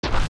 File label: Bomb Pull; A bomb pulled up from a bomb plant; zelda; OOT